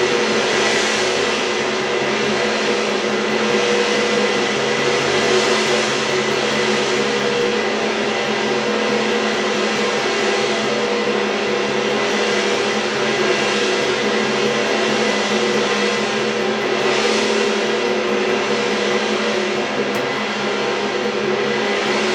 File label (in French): regime permanent, GONG VIBRATION